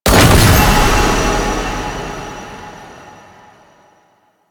Hazard Hero Target Break; Warframe; Duviri; Undercroft Hazards

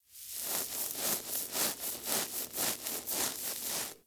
Kubrow Foley Interact Sit Scratch Forward; Enemies; Warframe

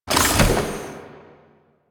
Door Small Close; Ambience; Warframe; The New War; Quests; Zariman

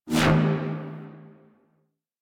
Duviri Medusa Puzzle Player Fx Enable

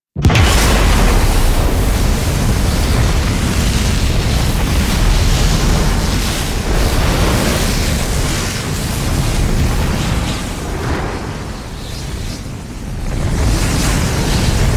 Blackhole Explosion; Cinematics; Warframe; The New War; Throne Room; Quests

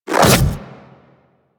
Melee Swipe Small; Enemies; Warframe; Infested; Grey Strain; Splitter

